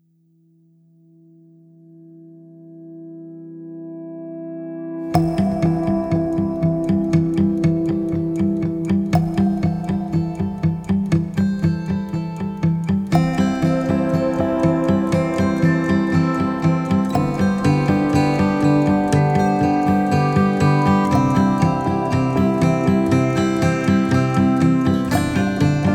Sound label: ocean chimes